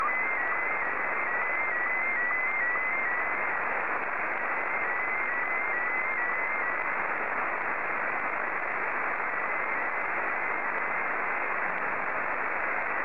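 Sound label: Martin 1, slow-scan television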